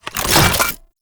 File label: Assassinate Key Drop; Warframe; Nineteeen Ninety Nine